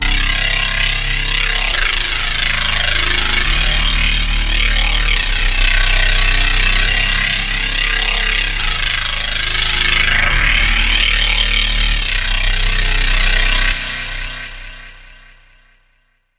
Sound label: Saw FX; Dubstep; Dubstep Sample Pack